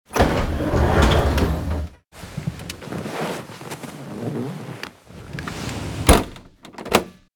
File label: Helicopter interior entering the helicopter cabin